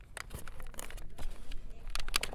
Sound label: Gentle Clicks; BB Retail Therapy Sample Pack